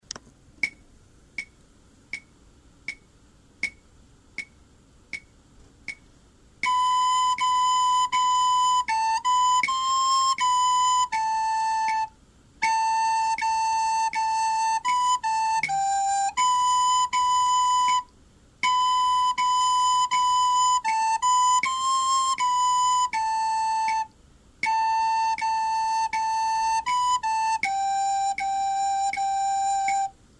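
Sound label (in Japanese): リコーダー; 成隣小学校 WEB音楽室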